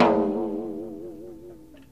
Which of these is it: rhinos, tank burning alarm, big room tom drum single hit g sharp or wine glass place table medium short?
big room tom drum single hit g sharp